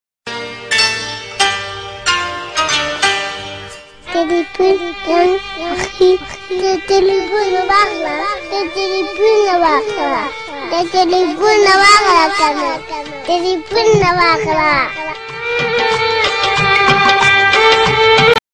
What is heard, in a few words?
Pashto Ringtone